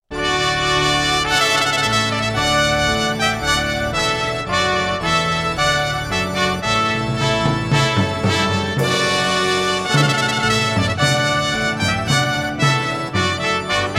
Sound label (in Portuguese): Trompete Voluntario